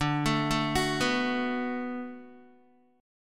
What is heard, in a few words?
D 6th D down Guitar, chord; D6 arpeggiated; tuning 4F,4A,5C,5G,5C,6E